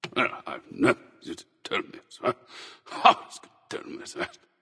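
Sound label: nonverbal grumble; smite; voicepack; merlin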